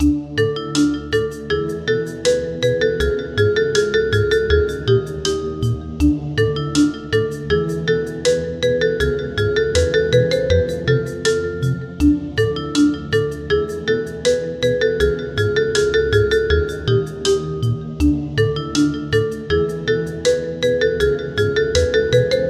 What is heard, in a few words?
rain